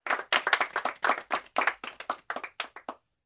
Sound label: outro Free Spin; PIXIGSLOT; netent